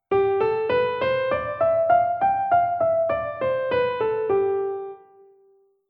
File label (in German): Tonarten G Mixolydisch Up Down; Paradiddle Kapitel 1